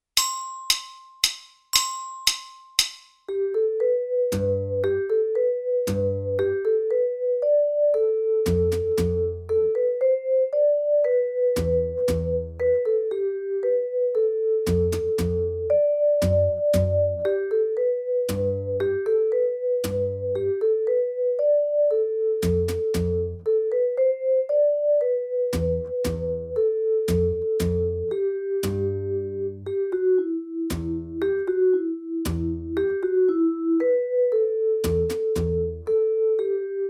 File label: Modal Waltz Track